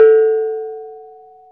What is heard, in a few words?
BONANG, Bronze Gamelan